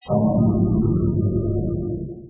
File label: sound bell